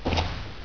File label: tap